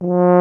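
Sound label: brass tuba; Miroslav Vitous; Solo Instruments VOL-2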